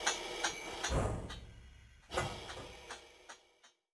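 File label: Amb Engine Start; Eve Amb Engin Start2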